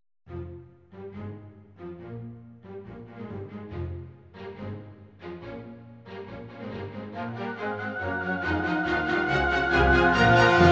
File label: oboe section